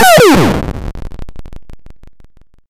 game over; gopher-kart

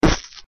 death; vex5

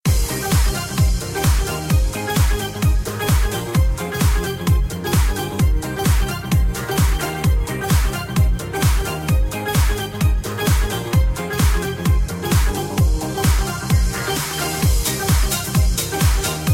song; vex3

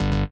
wrong; gate

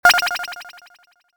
start bleep; ritz

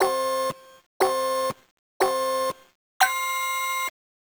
countdown; gopher-kart

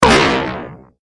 fall; vex4

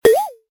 jump; ritz

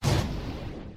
bang, tanks; mathsisfun

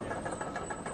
aim; tanks; mathsisfun

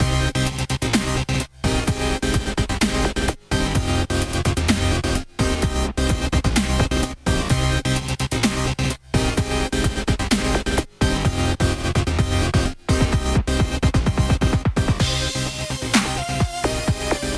song; vex4

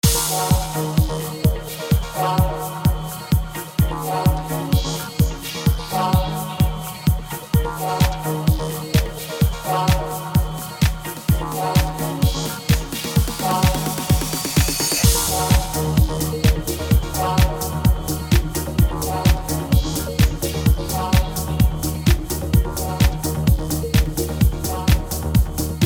menu song; vex3

